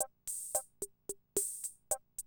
CR78, DrumLoops

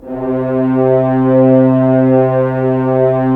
Horns; Roland - LA Composer vol. 4; HZ Horns

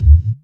FILTERED KICK

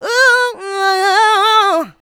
Vocals, Adlibs; Houseworx

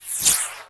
fx reverse; Ueberschall; Techno Trance Essentials

